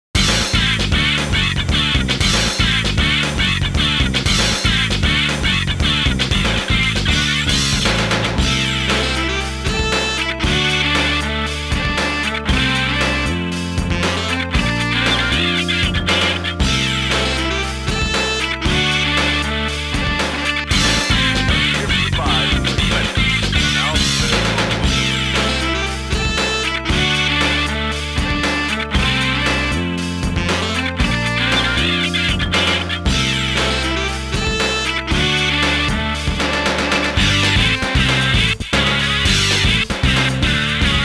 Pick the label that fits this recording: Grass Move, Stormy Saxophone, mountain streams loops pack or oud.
Stormy Saxophone